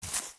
FS Weeds, footsteps

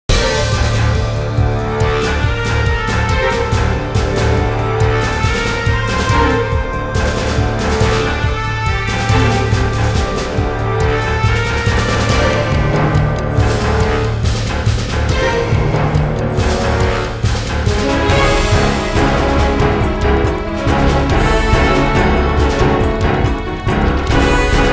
Temple Fight; music; The Da Vinci Code; XBOX

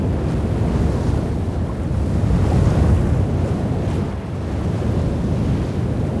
Beach Ambience; Totaled; XBOX

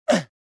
jump; reborn

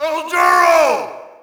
Shout, audience; UFC Tapout 2

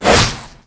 Cosh Swipe, Electric Cosh

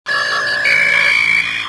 console, ambience; kejim

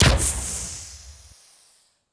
gren explo; impacts explos; dioxis

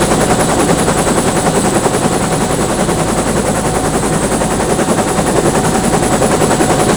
huey main; ambience; Soldier Of Fortune II Double Helix; XBOX

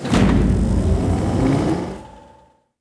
elec startup, ambience; Star Wars Obi-Wan; XBOX

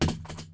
drop, tavern; AMBIENT; Pirates Of The Caribbean

